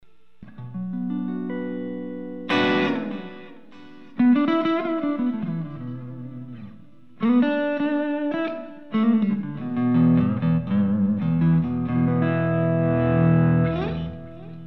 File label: SD1 Half gain